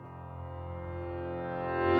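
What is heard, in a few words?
piano In reverse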